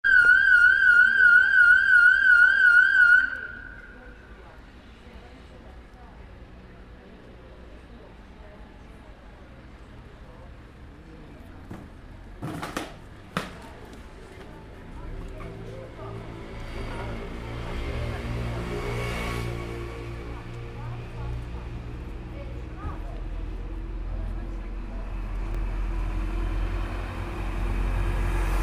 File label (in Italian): Suoni Sirena Traffico E Compressore Piazza; Piazza Pace; brione rovereto